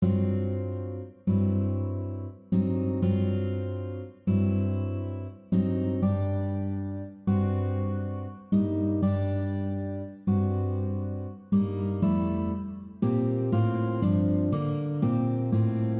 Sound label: guitar